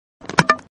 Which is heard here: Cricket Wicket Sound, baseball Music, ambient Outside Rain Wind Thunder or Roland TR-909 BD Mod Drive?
Cricket Wicket Sound